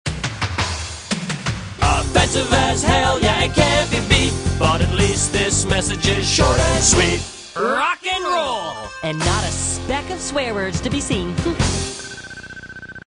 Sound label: Short Rock n Roll